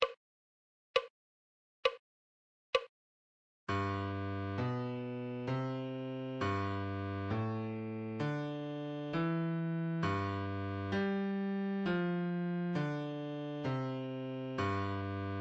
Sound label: musical reading in bass clef Adagio